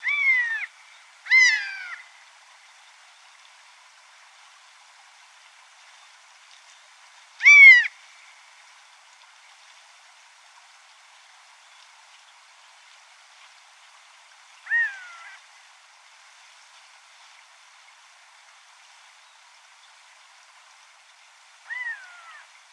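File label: long-legged buzzard voice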